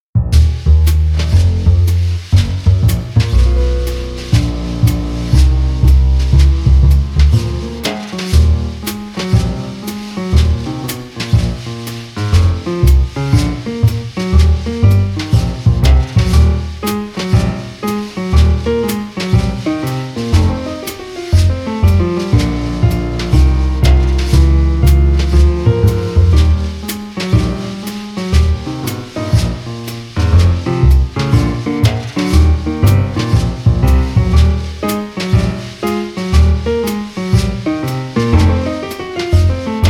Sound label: Blues, Jazz